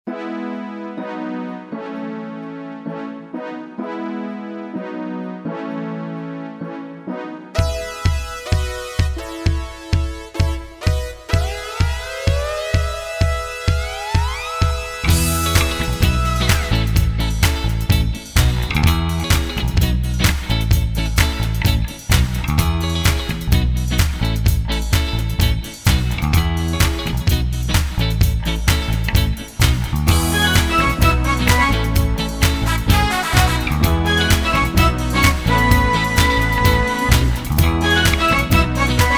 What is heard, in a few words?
Pop; Rothsee